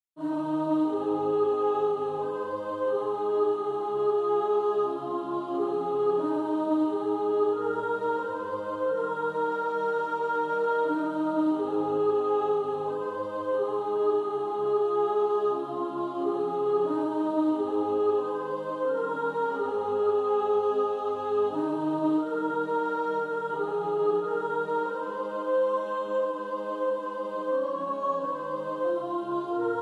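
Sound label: soprano, practice music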